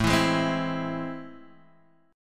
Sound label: A Minor Major 7th, down, Guitar, DADGAD